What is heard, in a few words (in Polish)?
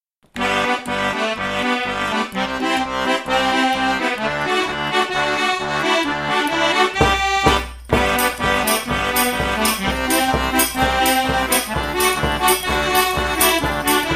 Krojcpolka